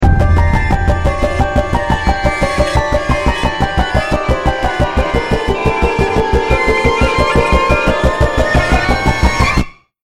bomb ten sec count; Counter-Strike Global Offensive; mateomessina

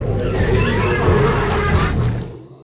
Entity Strike; Sid Meier's Civilization 4 Complete; Beyond the Sword; Units

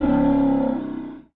Dread Die; Sid Meier's Civilization 4 Complete; Beyond the Sword; Units

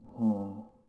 Gasmask Fidgt Vox; Sid Meier's Civilization 4 Complete; Beyond the Sword; Units

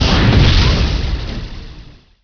sonic tank boom large; Emperor - Battle for Dune

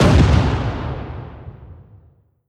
medium armour fire; Hearts of Iron IV Death or Dishonor

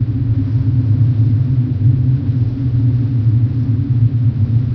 wind tunnel; Garry's Mod; hl2

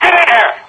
chopper, soldier; Left 4 Dead 2

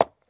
move; GrandFantasia

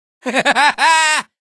laugh; Garry's Mod; hl2; Barney

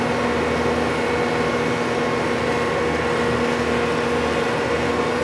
machine hum; ambient; Team Fortress 2

